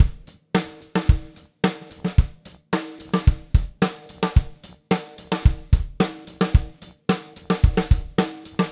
Black Maple Groove 110, DW Black Maple Kit, 110 BPM, Drums; Sonic Foundry (Sony Creative Software); Vital Drums